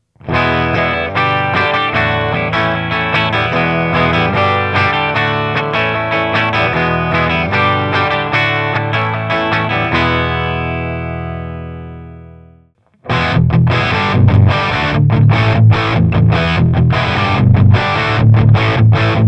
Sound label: Marshall Jubilee G12T-75, guitars; kaappitesti